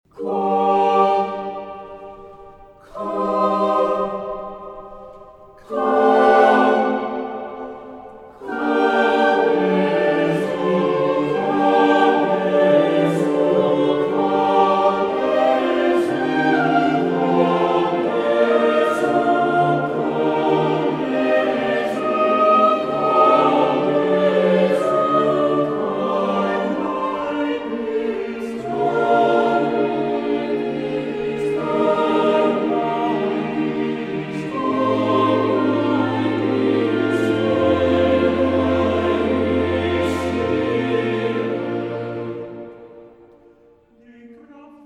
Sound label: German Sample